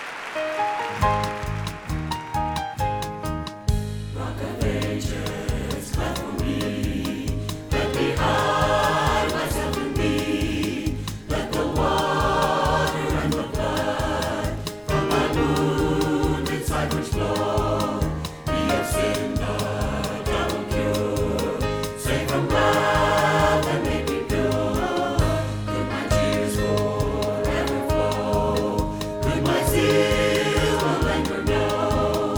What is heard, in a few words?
Choir, Rock of Ages